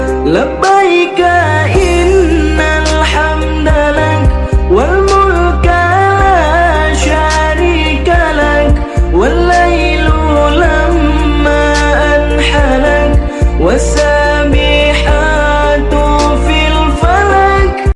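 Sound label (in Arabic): haq hai allah arabic devotional islamic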